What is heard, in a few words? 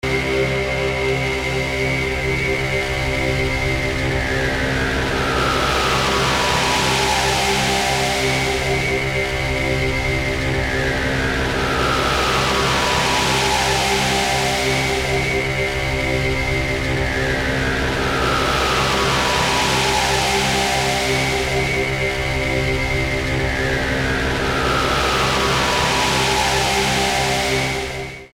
distorted sweep pad